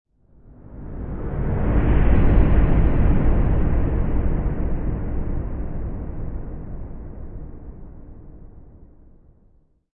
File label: Huge Structure Pass By, Large entity bypass or horror presence